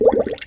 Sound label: Bubbles; musicradar; cartoon caper